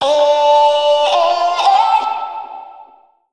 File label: phrase Dm, Vocal; Slate Digital Omnivox Vocal Sample Pack